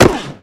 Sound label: Hockey Player Body, hockey